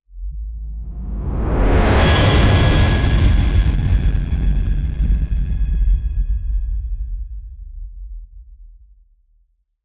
a dark powerful and utter, bass heavy

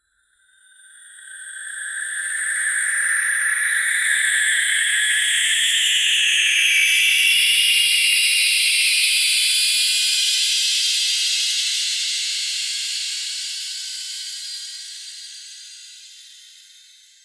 the magical sound of twin; accelerating

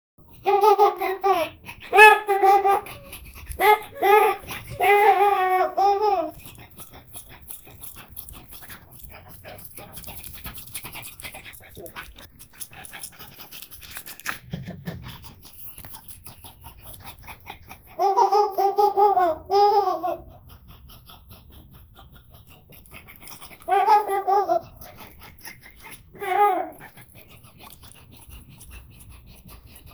a 21 year old man playing

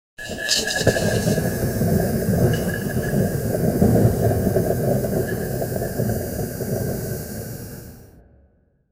sizzling cauldron over a fire

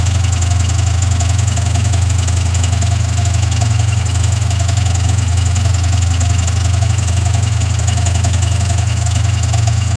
diesel

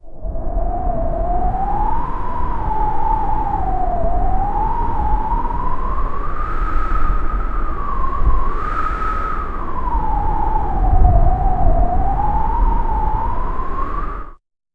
wind wind wind wind, calm

